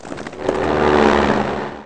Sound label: plane